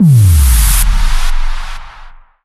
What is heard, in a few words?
Pre Wild Start; dazzle